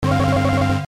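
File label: electric; End of Aspiration; android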